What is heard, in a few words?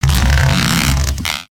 Jail Cage Swing, gnarled forest